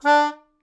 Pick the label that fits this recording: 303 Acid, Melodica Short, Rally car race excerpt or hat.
Melodica Short